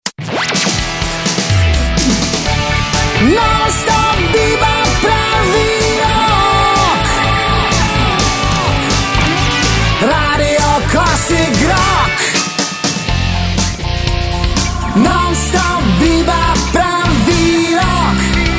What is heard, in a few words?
classic rock